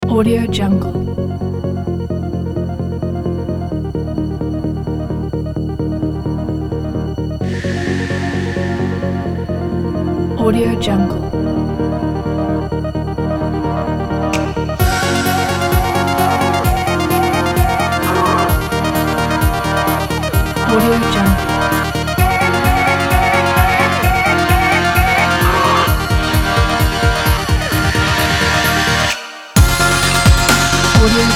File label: sport epic future bass